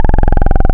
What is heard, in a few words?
Sub Phat OD Saw, wavetables; Animoog